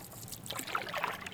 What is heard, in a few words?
Canoe Paddle Light Paddling In Canoe